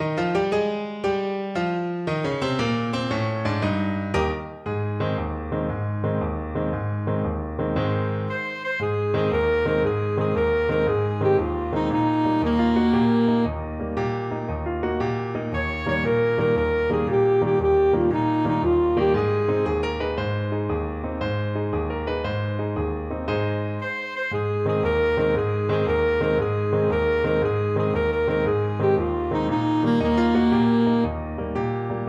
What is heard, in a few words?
luna mezzo mare, saxophone